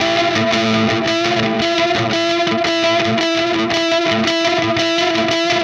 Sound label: Static FX Guitar